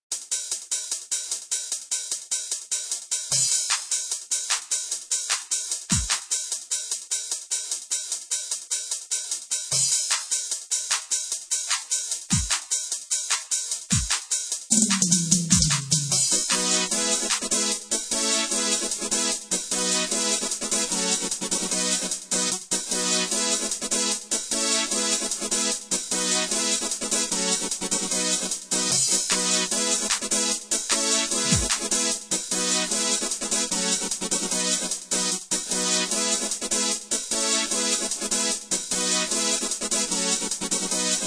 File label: rhythm